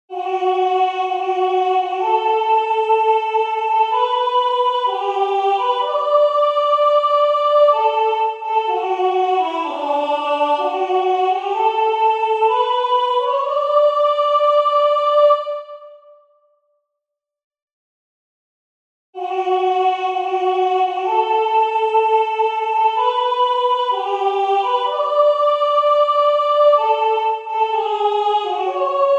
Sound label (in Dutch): Kerstnacht Cantique de Noel Sopr.